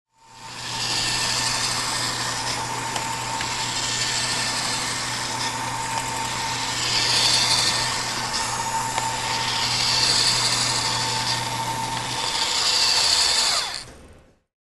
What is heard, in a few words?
Flying Book Apparatus